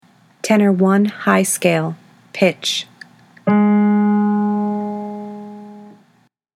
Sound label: tenor scales high; all-state choir